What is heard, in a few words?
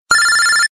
blackberry ring tone; ringtones; sms